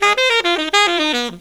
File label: SAX, Killer Brass Riffs